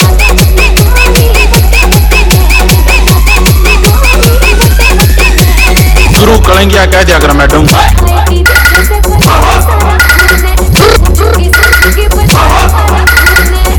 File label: Ultra Bass Mix